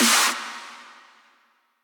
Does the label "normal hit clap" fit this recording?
Yes